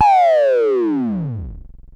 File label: Perc Synth Raw